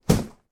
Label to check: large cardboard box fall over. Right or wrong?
Right